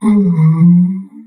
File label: Stun; Drash